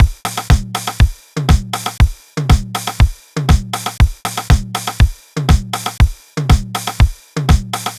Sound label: Loops, 707; classic machines; 707mod